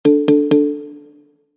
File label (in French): BIP progression